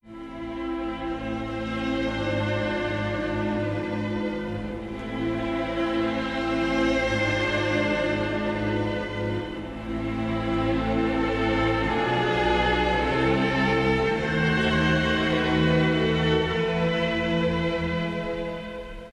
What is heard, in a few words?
strings, Loops, Sad